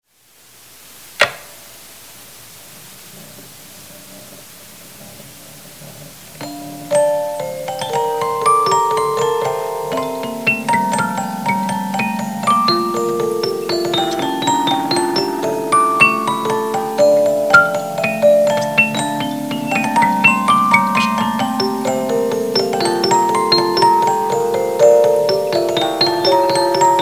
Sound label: menuet, music box